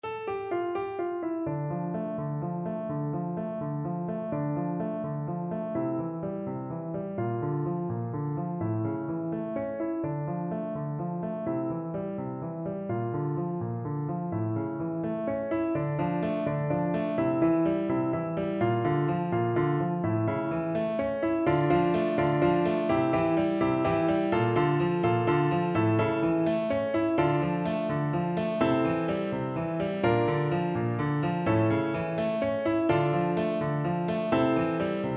spanish violin, Recorder

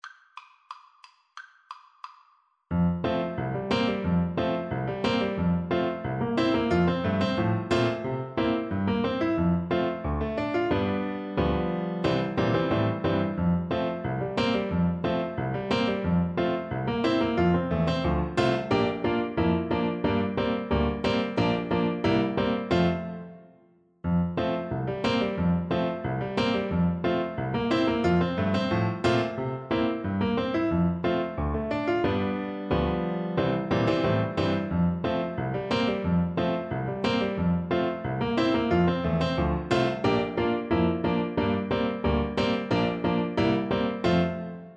american patrol, Tuba

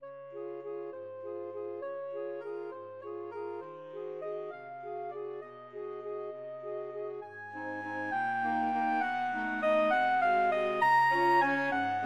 tchaikovsky waltz, saxophone quartet

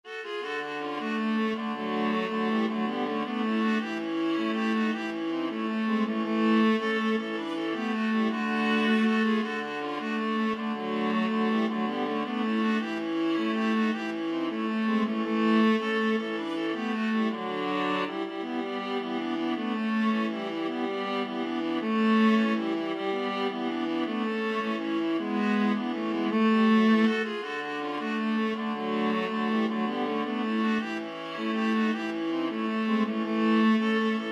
mexican hat dance viola trio

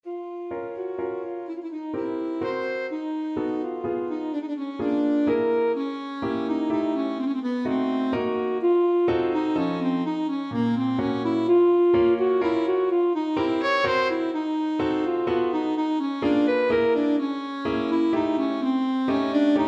mazurka, saxophone

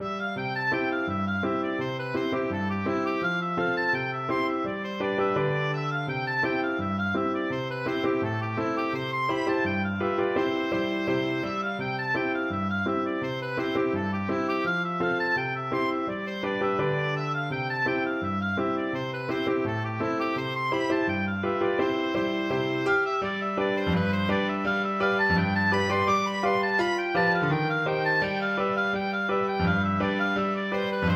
autumn woods, oboe

